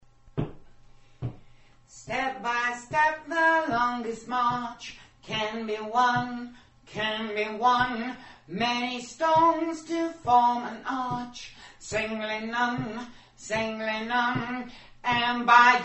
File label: bass